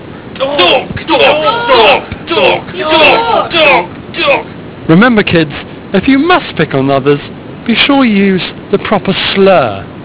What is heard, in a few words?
Shot; Captain Correct